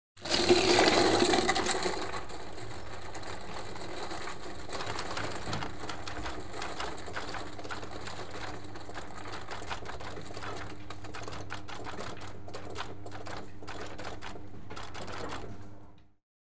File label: Gambling - chip sorting machine; The BBC Sound Effects Library; Sport and Leisure